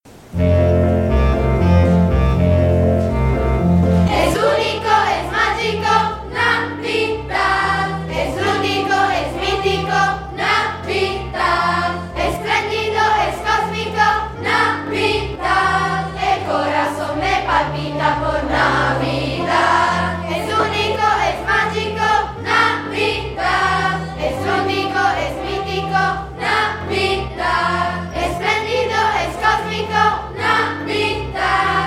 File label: navidad coro